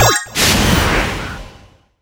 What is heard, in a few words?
Splash Start Spin; netent